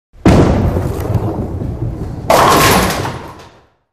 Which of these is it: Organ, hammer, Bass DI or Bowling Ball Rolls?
Bowling Ball Rolls